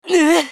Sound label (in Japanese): ファイター男 被ダメージ